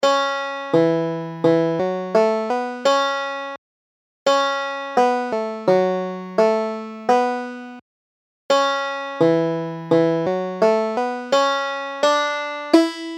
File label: margarets waltz, banjo